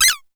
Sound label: SQUEEK; Best Service Dream Experience; SF+EFFECTS